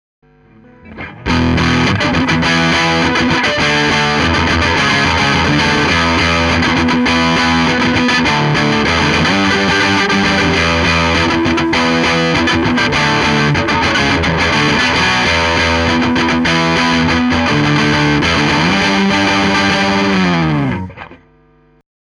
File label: sm 57 Egit drive dry; Soyuz the Launcher